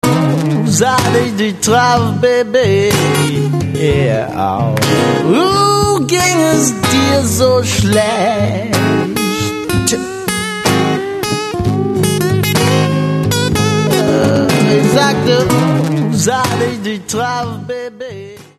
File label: raab blues